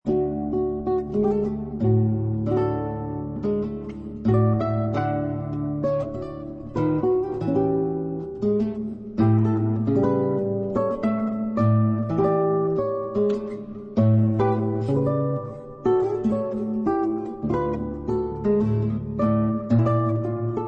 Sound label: Tango